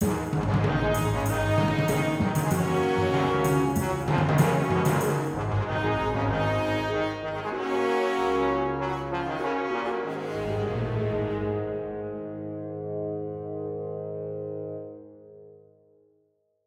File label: Victorious Neutral; Mount & Blade: Warband; Android